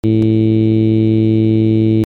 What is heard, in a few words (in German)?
saegezahn 110 E